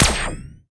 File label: Bio Gun Shot